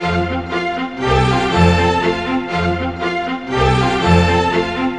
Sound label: Classical Strings, Loop Samples, Orchestral; Sonic Foundry (Sony Creative Software) - Crimson Blue and Fabulous Horncraft 4 RnB